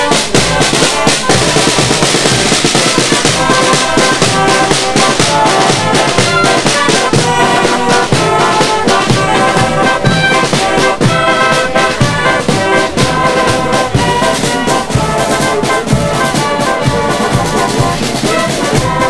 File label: revolution music; best service Blue Box